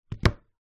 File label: household phone cell samsung galaxy s9 in case set down table; zapsplat